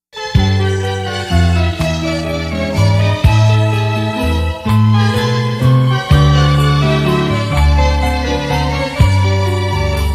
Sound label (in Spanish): Himno; Departamento Del Huila